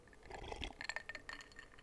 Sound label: Taking A Drink